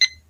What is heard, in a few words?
tick